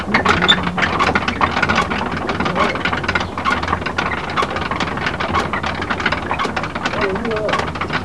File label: prayer wheel